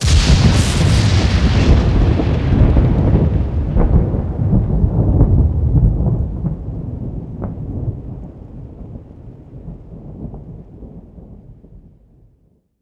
Lightning Thunder 1 shot; Journey

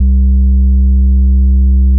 Synth Bass

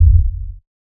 Kick Sub Wool

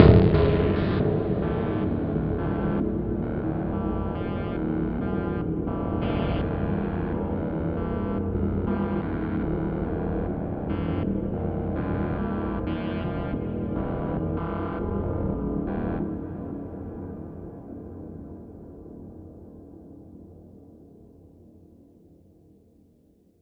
Impact Deep Pad 120 bpm; One Shots; FX; Ableton Live 12 Lite; Core Library

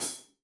Hihat Closed Brush Club Sizzle

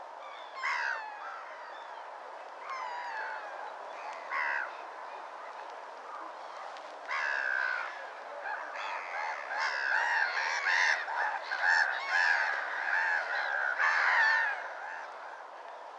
weird seagulls loop; background

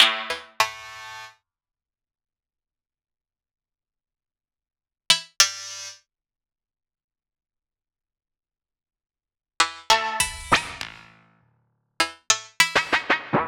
Velo Rezzo Plucks, Synth; Loops; Tonal; Ableton Live 12 Lite; Core Library

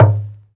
Wood MPC, One Shots Drums

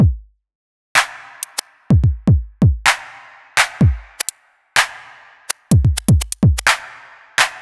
Dubstep Slow 126 bpm, drums; Loops; Ableton Live 12 Lite; Core Library

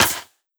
Perc Strike, Misc Percussion; Drums

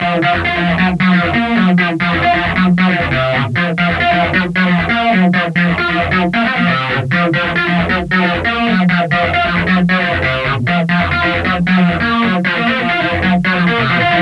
Heavy Synth Melody; Sony; ACID Pro 7.0

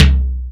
Tom; Drums

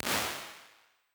Early Reflections Vocal Wet R, impulse responses; Ableton Live 12 Lite

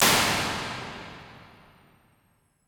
Hybrid Plates Plate, Impulse Responses; Ableton Live 12 Lite

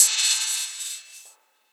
Hihat Open; One Shots; Drums; Dust Pod

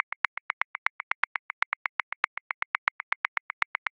Perc Tambourin Accent 16ths; Grooves; Percussion; Ableton Live 12 Lite; Core Library

